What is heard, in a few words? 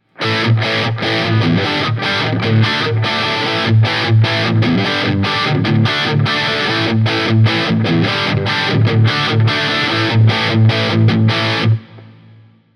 mojo8 dirty, humbuckers; pickups